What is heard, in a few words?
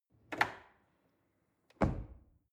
drawer cutlery close draw short